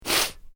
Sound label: MALE SNIFF SHORT; Sick Sound Effects; Male Vocal sounds; Sick (Sound Effects:Human)